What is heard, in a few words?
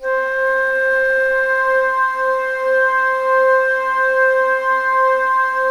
ALTO C4, ALTO FLUTE; USB Soundscan vol.23